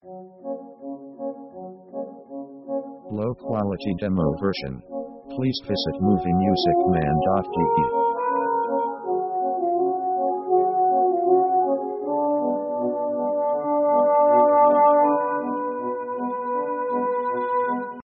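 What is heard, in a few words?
orchestral funny marching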